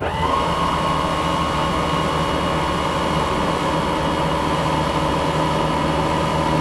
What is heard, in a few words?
Aircrafts Air Brake; DCS